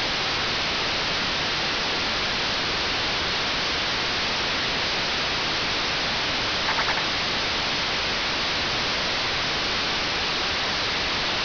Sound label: FSK441 train